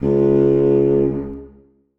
contrabassoon c#2; Sonatina Symphonic Orchestra

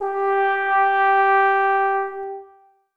tenor trombone g4; Sonatina Symphonic Orchestra

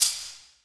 shaker a, Percussion; Sonatina Symphonic Orchestra

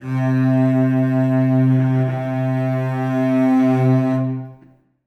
cello c3; Sonatina Symphonic Orchestra

